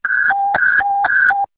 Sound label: Fire Pre Alert